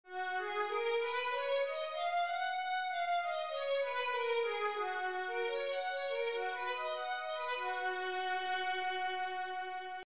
violin G flat major, scales 1 octave

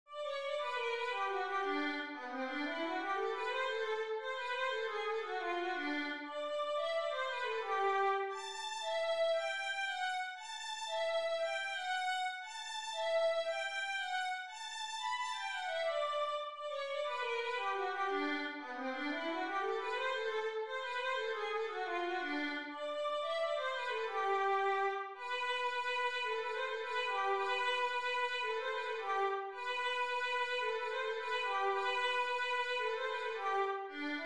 jarabe violin

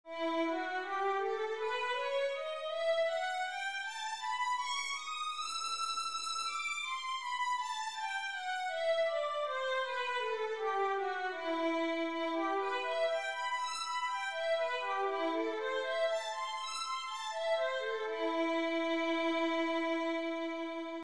violin E minor, scales 2 octave

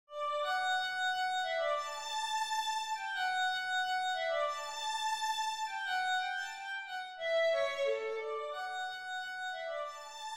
spring violin melody

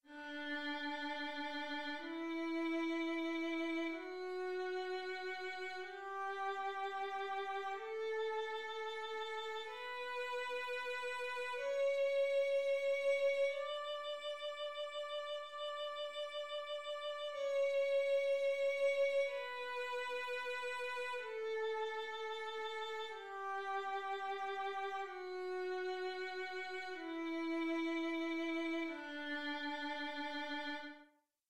dmjscl wholenotes, violin

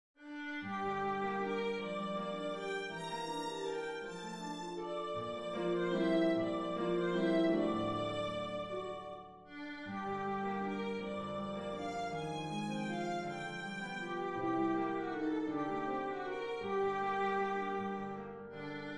sicilienne full

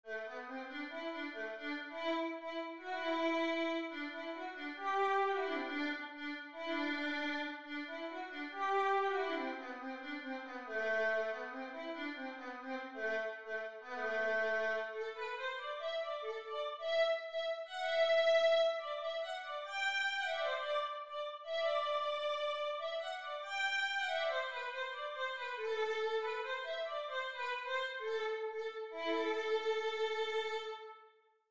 braul violin

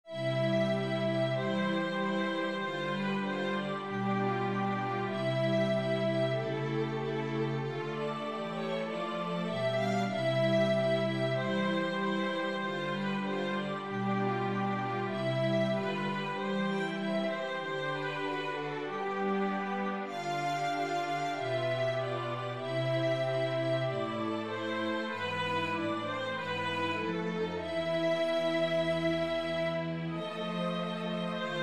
rosamunde andante full

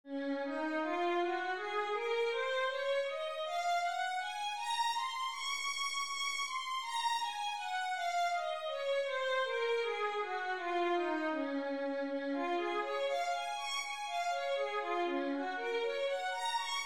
violin D flat major, scales 2 octave

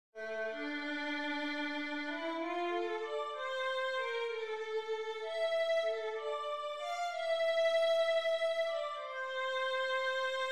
elegie violin

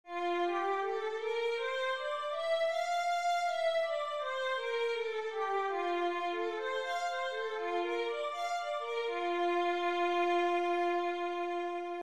violin f major, scales 1 octave

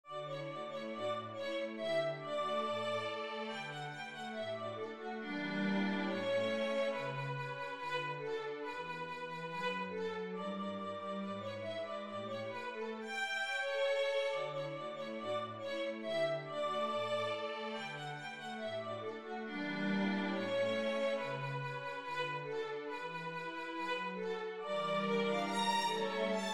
nutcracker full

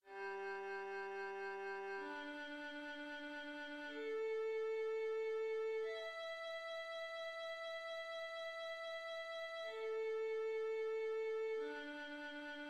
open strings eighth notes, violin

